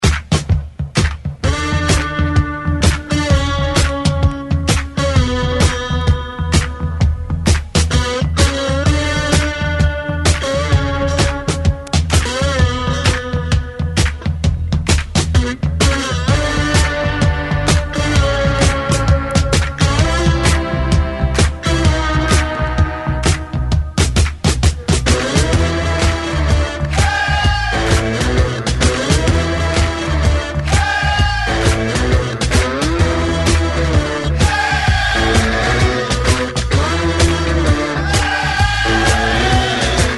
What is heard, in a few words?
Rock N Roll Intro Rock; DJDOLLS